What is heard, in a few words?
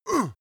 male attack strained; schreie; menschen